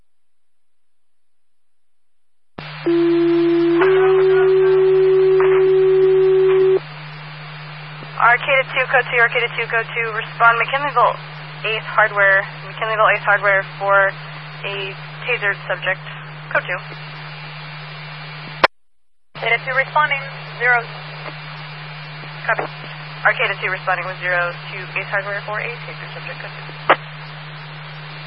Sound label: tasing